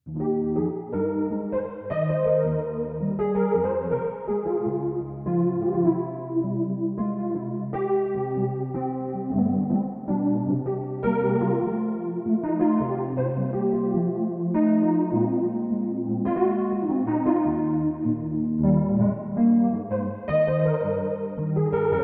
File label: Wurli